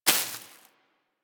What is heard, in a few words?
Bullet Impact Debris Grass; Warframe; Weapons